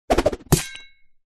warfare throwing star throw spin hit person squelch blood